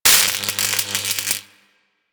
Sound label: UI Crafting Spark D; Warframe